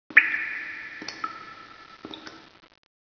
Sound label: cave